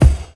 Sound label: original bass drum F sharp key; kick drum samples